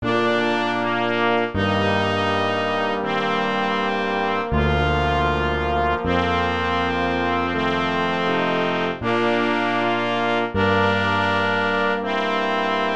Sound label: Nimrod Brass